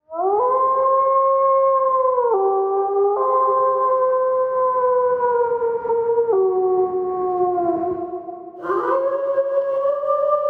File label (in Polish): Odglosy zwierzat WILK; Odgłosy zwierząt